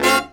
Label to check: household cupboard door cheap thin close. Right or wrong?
Wrong